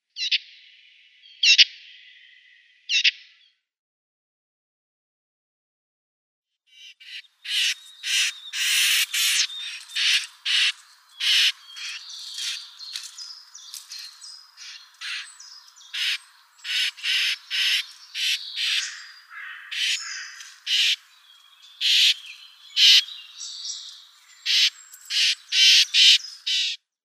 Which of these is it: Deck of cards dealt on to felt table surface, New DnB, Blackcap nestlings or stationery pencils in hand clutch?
Blackcap nestlings